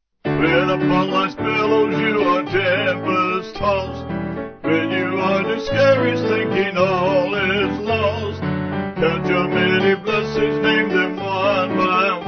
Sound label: When Upon Lifes, Vocal Piano; Band